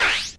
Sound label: vwip, Swish